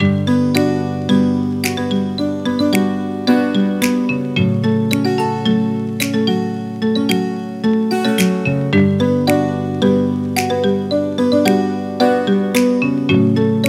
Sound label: Relaxed